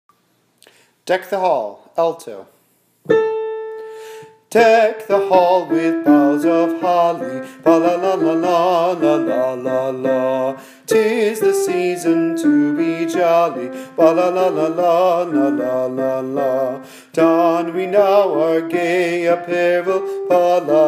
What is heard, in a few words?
alto; deck the hall